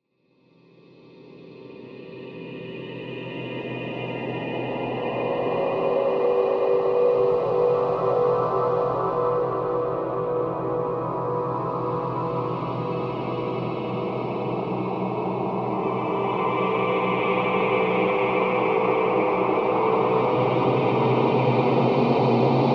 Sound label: Turbulence